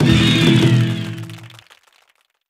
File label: Judging Complete (with SFX)